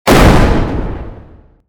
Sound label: explosion short tail; micromayhem